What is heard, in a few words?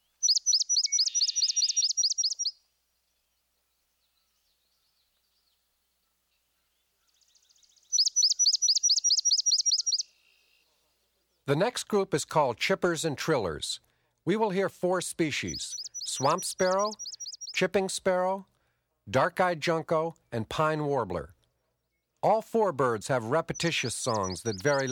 Chippers and Trillers Swamp Sparrow; Eastern and Central North America; Birding by Ear; Peterson Guides 2009